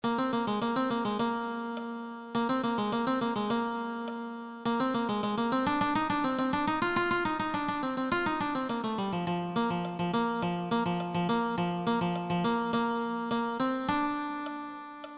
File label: guitar